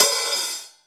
half open, Drums Percussion; Drum Bass; Zero-G; Total Drum Bass

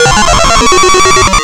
LFO seq; Big Beat Samples & More; AKAI; ESO SEQS KIT

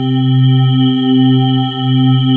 DIGI CHOR C3; Q Up Arts; Dream Experience

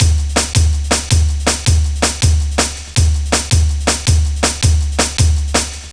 Porta, breaks; Jungle; Jungle Warfare 1&2

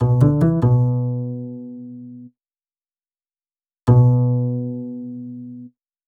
Upright Intro, Cinematic Funk Urban Tone Poems; Sonic Foundry; Sony Creative Software

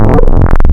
Many Waves, wavetables; Animoog

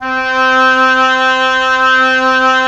WND OB F, Oboe f; Roland - LA Composer vol. 4

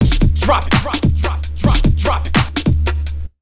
AMB 147 BT1 L, Big Beat Drumloops; AMBIENT 147; AKAI

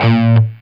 SOLDANO ROCK, Guitars

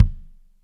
KICK, JAZZ KICK

